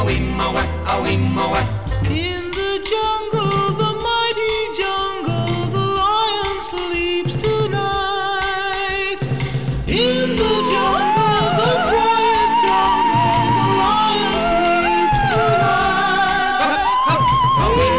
rock

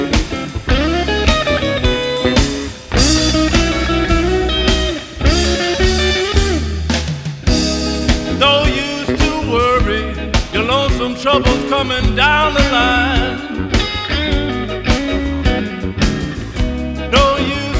blues

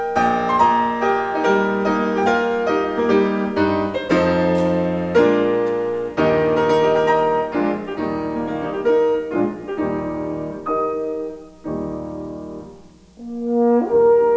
classical

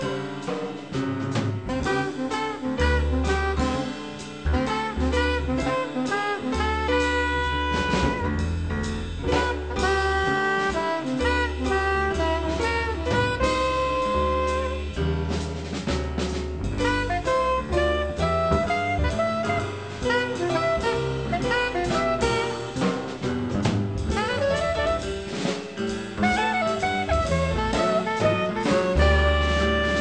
jazz

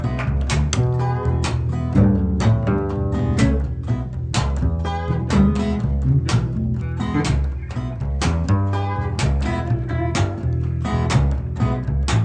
blues

